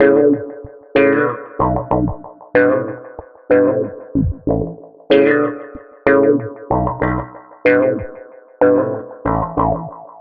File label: Filtered Funky Bass, Electric Bass